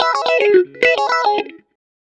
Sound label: 107 E-Coast HipHop Clean Guitar Line C#m